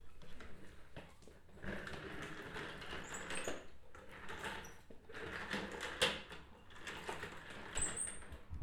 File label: Wheely Chair